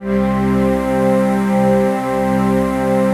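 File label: chord pad, Pads; Best Service; XX Large - Pads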